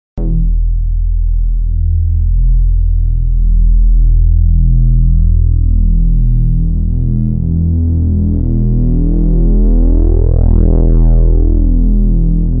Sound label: bass